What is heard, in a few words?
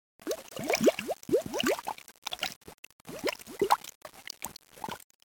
underwater bubble